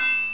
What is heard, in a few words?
ANVIL